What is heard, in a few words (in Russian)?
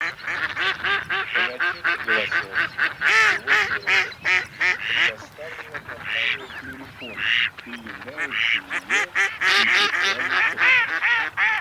Кряква Germano; plurifon